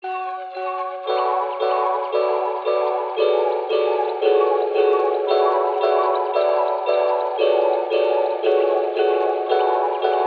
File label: 114 BPM F# Min Delay Pad; Trap Melody Loops; Loop Stems; Cymatics; Nightmares; Fireflies